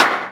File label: Percussion; Electro Flux Sound Kit